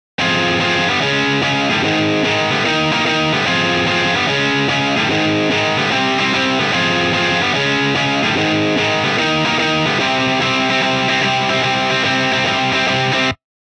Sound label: boost Off